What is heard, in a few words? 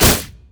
thorn plant; Zyra; LoL